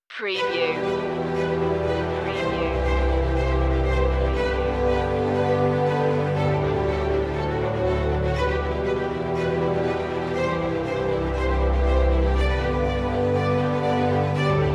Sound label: natural greatness orchestra only